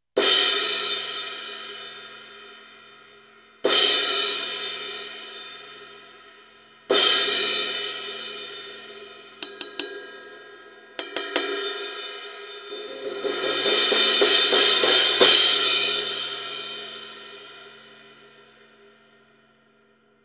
19 K Sweet Crash, cymbal

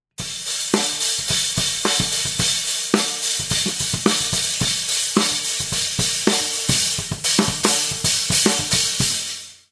Spiral Stacker Over 14 A Custom EFX, cymbal

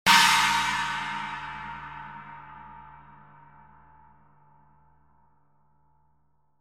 19in China, cymbal

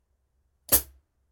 13in Pocket Hats, cymbal

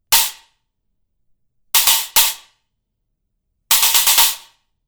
Stacker Pair, cymbal

